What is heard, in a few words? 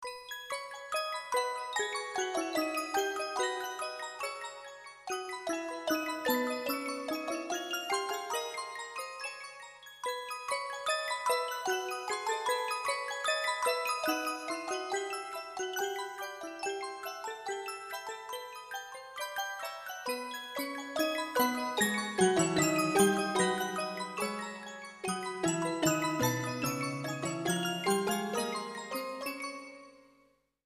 Duet